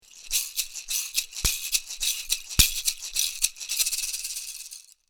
son Maracas palmier; Percu; Duperrex

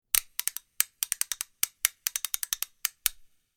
son cuiller bois

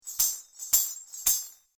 Sonnailles son; Percu; Sonnailles 2; Duperrex

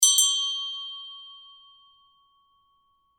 son Cloche; Percu; Duperrex

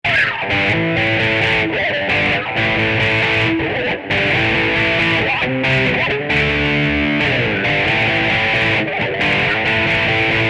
son Guitare electrique; Corde

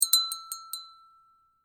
son Cloche verre; Percu; Cloche 4; Duperrex

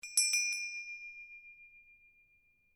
Son Cloche mexique; Percu

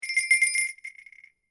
Son Cloche globulaire; Percu

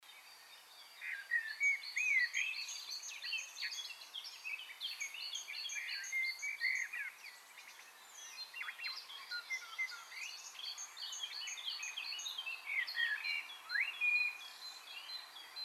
Oiseaux dimanche; Communication animale

